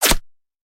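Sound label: headshot sounds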